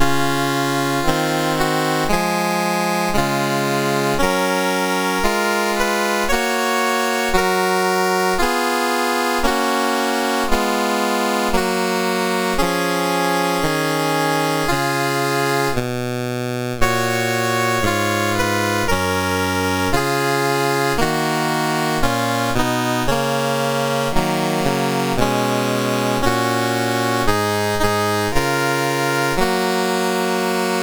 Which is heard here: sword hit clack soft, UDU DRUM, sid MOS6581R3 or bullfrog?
sid MOS6581R3